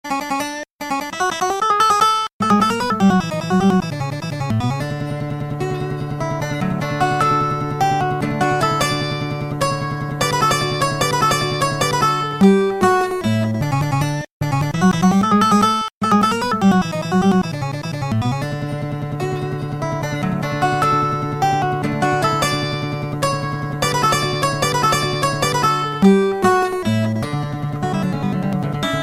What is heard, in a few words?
impossible guitar